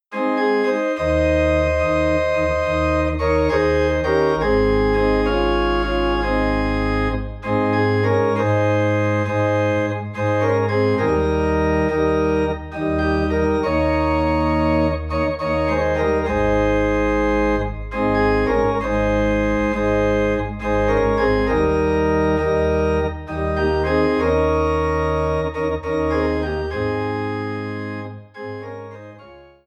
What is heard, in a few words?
Organ in A Flat; I Will Sing of My Redeemer